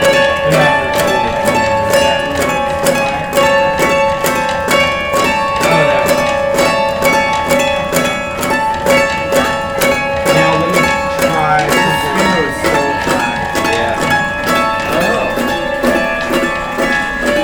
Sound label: music sculpture